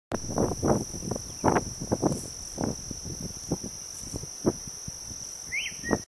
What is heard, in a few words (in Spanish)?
Guaipo